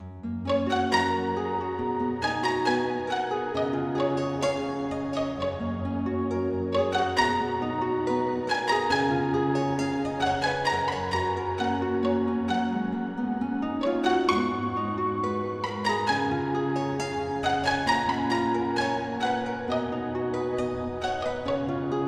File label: Guitar